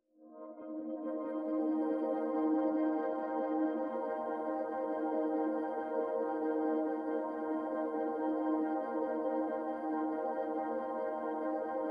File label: ambient